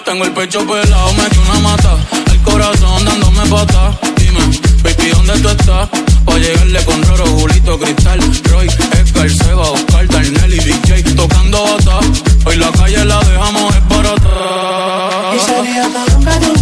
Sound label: Afro House